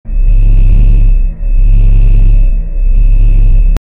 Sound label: reactor sound